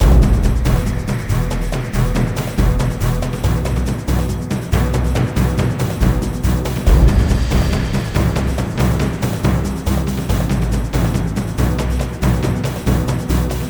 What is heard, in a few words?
battle spider tunnels; The Warlock of Firetop Mountain